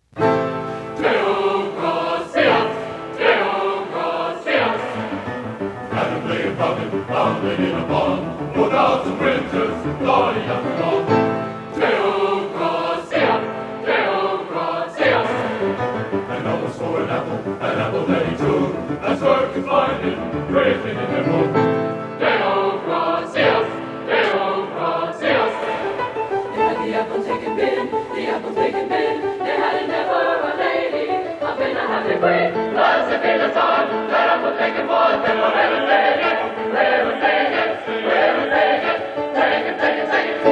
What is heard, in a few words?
Choir; Britten, Benjamin - Ceremony Of Carols -'Deo Gracias'; USC Pantheon Choir -Pgh